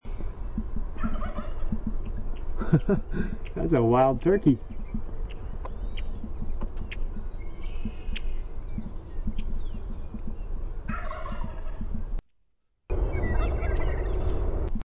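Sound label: Wild Turkey gobble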